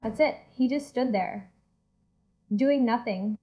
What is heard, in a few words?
beat